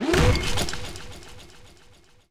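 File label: ricochet hit modules, tanks; killscene; War Thunder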